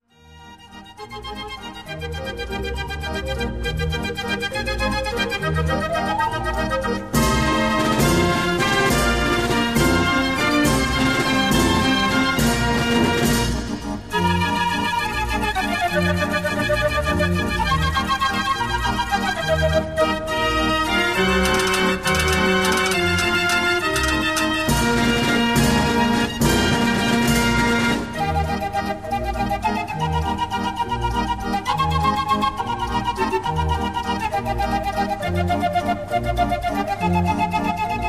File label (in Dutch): Draaiorgelfavorieten, hollandse klanken op draaiorgel